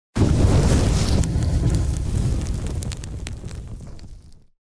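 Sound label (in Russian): огненный взрыв; Звук огня; Звук огня для монтажа перехода; Мощные огненные взрывы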